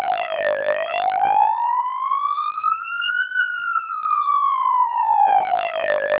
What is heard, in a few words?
siren, CODEC2 3200 bps